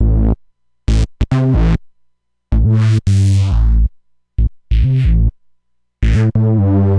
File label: bass loop, drum n bass; Sample Remix